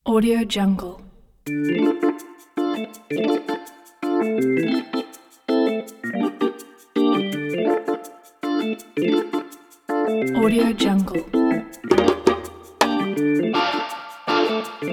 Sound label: urban reggae; wavesound